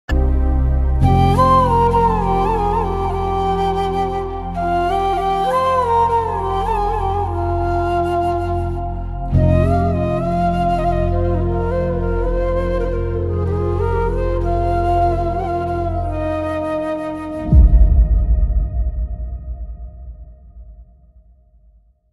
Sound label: sad bgm; malikappuram